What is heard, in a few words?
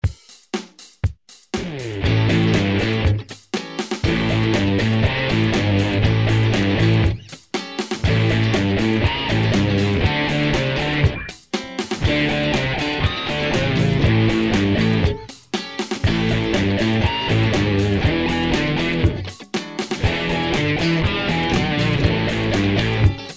basic band